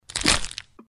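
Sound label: sound of chick breaking egg shell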